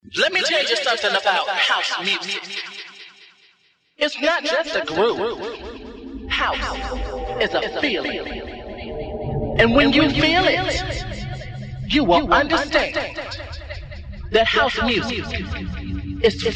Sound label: (Accapella - Acapella - Vocals)